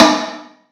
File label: Snare rusty, drum hits; Zero-G; Soundclash